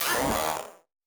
Battered Synth; malfunctioning synth samples

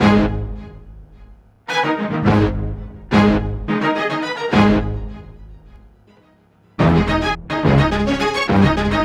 Dramatic Strings; Club Joints and Street Anthems; Lions Den

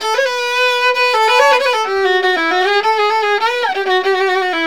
VIOLIN, Balkanish Traditions; USB Soundscan vol.63; AKAI

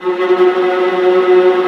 Viola F#2; Digital Sound Factory Virtuoso

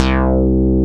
HIP, HIPHOP; Rubber Chicken Software Sample Bank

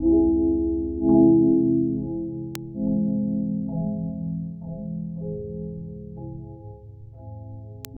Chyme; Sonic Foundry (Sony Creative Software) - Future Retro Dance Excursions

